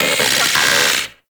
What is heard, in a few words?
Arachnoid Wraith Vocal Pain; Warframe; Corpus